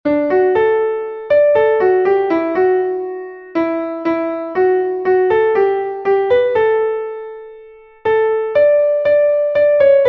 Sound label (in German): klavier; MelodieMeister